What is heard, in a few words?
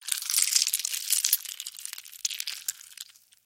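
spider sound; pauk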